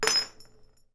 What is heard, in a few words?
steampunk weapons grenade bounce; soundmorph